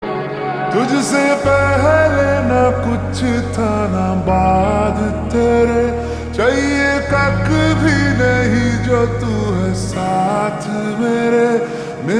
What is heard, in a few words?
Ringtone Slowed Reverb